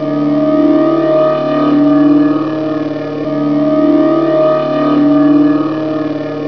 zap machine alien, ambience

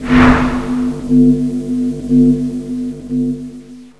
voodoo device loop; zombie

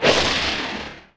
sacrifice; d2lod

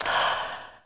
zombie ghost footstep; ghostfury

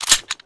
ready, weapons

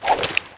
spin, weapons

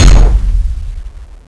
attack rocket

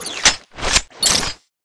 beamgun reload, weapons

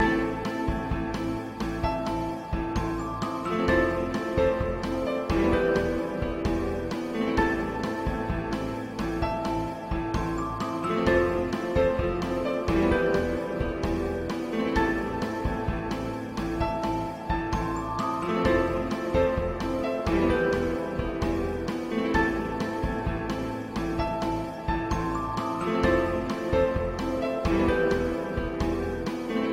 elevator music loop; ambience

